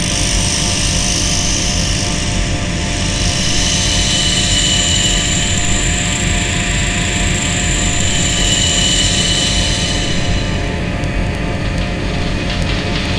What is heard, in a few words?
Atmos; Jungle; FX; Blu Mar Ten - Jungle Jungle - 1989 to 1999 Samplepack